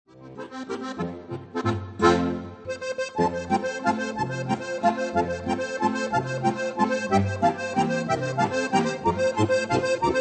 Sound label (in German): walzer